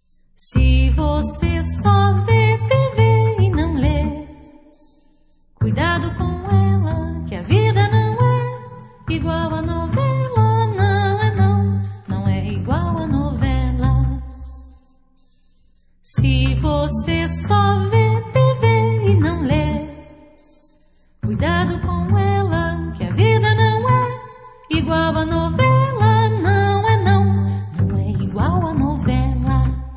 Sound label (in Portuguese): a caixa de musica